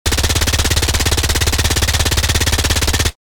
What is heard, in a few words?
MAC-10 submachine gun full auto fire; war